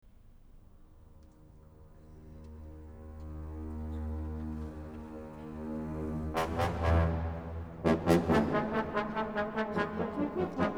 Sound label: Orchestra; Tempo I